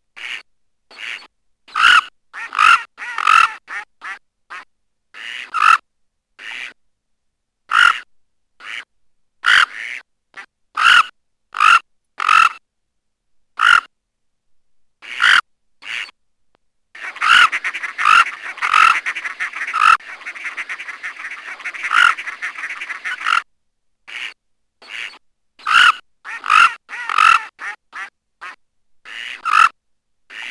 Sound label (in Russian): hohlataya chernet golos